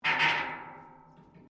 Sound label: METAL RUSTY SCRAPE